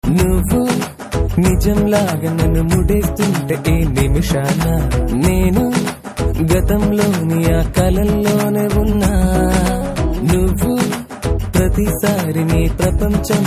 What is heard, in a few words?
Telugu Ringtone